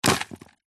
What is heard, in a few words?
foley dirt soil drop onto plastic upturned tub pot recorded underneath inside